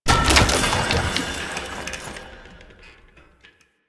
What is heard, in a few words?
Target Dummy Impact; Warframe; Duviri; Waiting Room